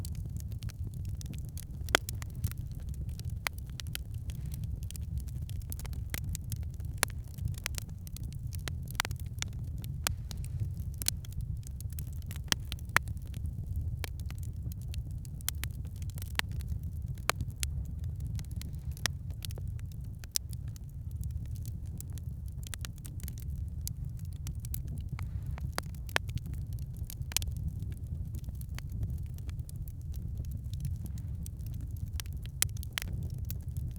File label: winter campfire crackling